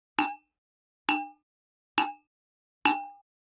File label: Generic Click Glass Small